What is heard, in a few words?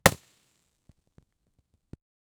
fire arrow hit small expl, crackling